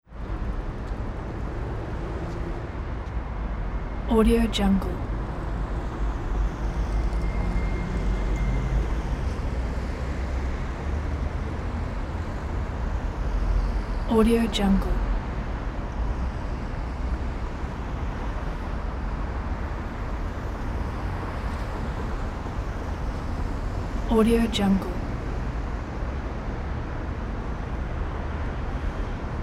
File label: city street midday traffic